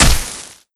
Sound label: branding iron hit flesh; tf2